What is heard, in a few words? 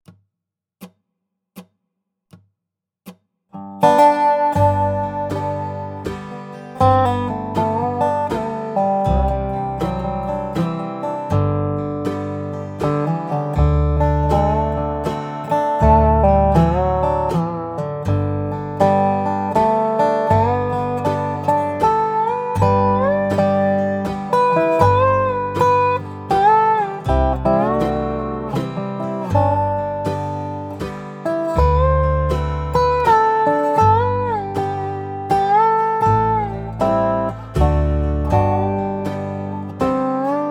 Int Adv Dobro with backing track; Away in a Manger; Holiday 2025 Dobro Zoom Workshops